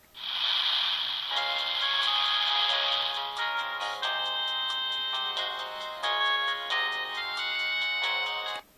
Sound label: samsung sghe700 ringtone